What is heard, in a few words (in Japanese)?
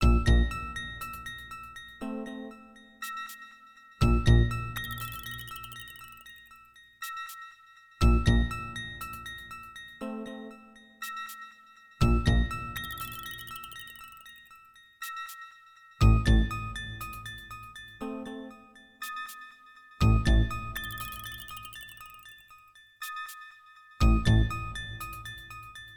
囚われて (MT-32版)